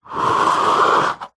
household plastic plant pot slide on wood surface; zapsplat

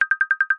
multimedia game sound menu toggle slide select

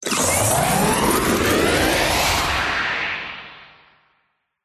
robotical power up sequence

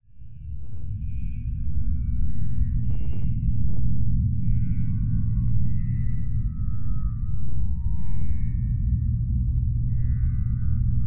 Dark SciFi Drone Mixed; soundbits

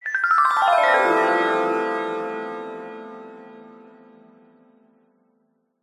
magic glissando descending bright warm twinkle; fantasy; zapsplat

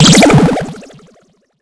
cartoon impact clobber dazed shocked

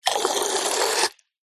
water jet spray fast forced syringe into water; foley

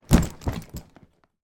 foley luggage suitcase fall over

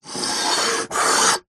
beer can empty slide table; food drink; zapsplat

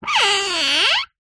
string rub wood squeak; foley

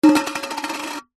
foley coin down on thin metal surface

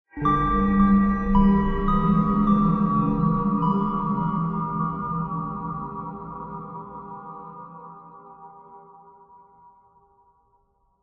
horror ghostly musical riff nursery rhyme

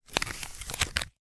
office printer ink cartridge in wrapper handling

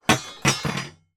imact metal steel strips drop on wooden floor

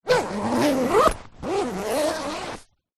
foley bag backpack zipper; zapsplat

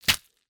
ICE Thin Smashed Chunks in Bag

